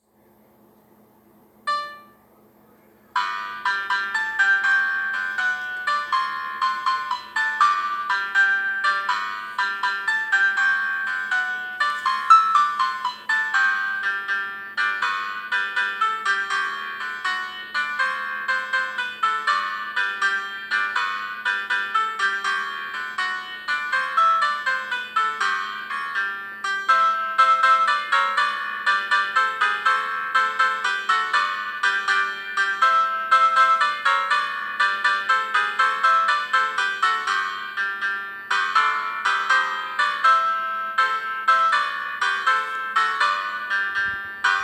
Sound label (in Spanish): himno; HIMNO DE RIEGO; Arr. R.Burgos